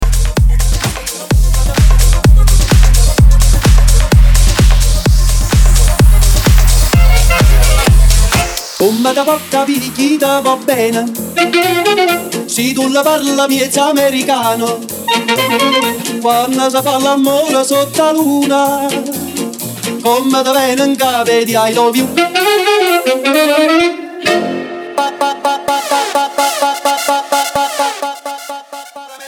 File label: BIGROOM 128BPM